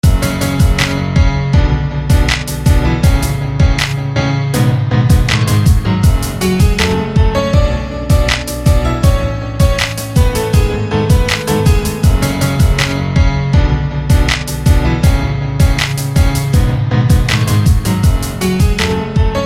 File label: Greatness Piano; SFB FX